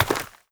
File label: step, footsteps, player, dirt